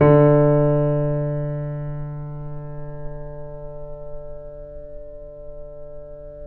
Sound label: PP D 6 off, Grand Piano off mic; AKAI S6000 CD-ROM - Volume 2